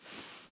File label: detector hide; STALKER; IWP